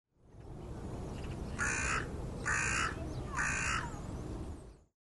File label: crow; mudchute